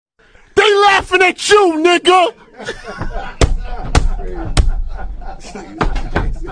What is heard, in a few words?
laugh